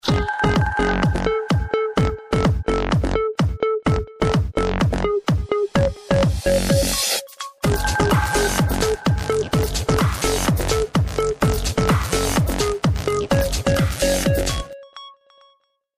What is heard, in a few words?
Quartz, Ring tones; LG GD910